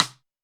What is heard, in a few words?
Snr Big Stick, Alesis D4